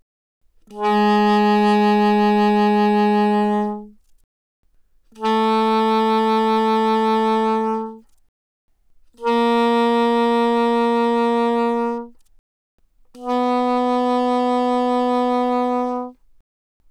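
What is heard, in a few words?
Soprano Sax Vibrato mf Ab3 B3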